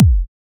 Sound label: Kick; ENZO Drum Signature Bundle Vol.1